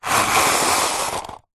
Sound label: table tennis portable retractable net pull open out plastic housing; zapsplat